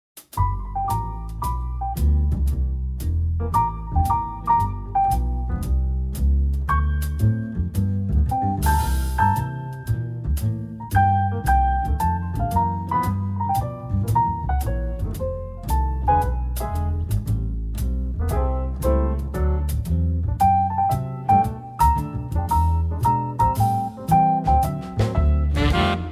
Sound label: Basic Blues